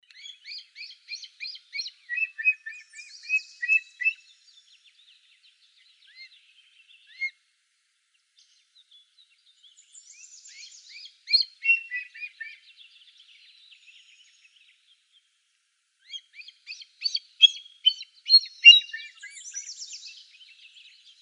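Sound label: Common Black Hawk, Birds of Prey; CBHA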